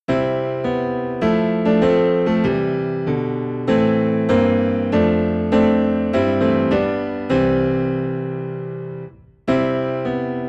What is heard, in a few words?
Piano Low in C; christmas; Go Tell It On the Mountain